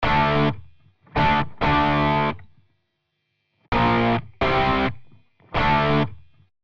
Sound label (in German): E-Gitarre Dist OPAMP Rock Guitar Fatness